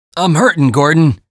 wounded; barney

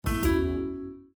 Windows Hardware Insert; Windows 7 Sounds; Cityscape

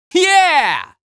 yell; barney

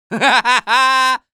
laugh; barney

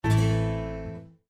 Windows Battery Critical; Windows 7 Sounds; Festival

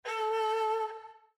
Windows Balloon; Windows 7 Sounds; Calligraphy

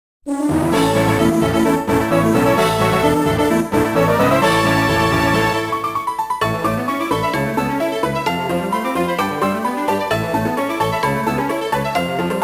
Victory Against Elite Four!; Pokemon Diamond & Pearl Super Music Collection

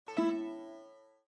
Windows Pop-up Blocked; Windows 7 Sounds; Raga

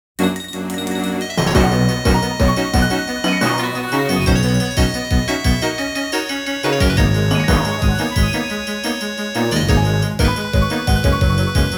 Bicycle; Pokemon Diamond & Pearl Super Music Collection

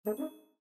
Windows Pop-up Blocked; Windows 7 Sounds; Landscape

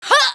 pain, female; Half-Life 2

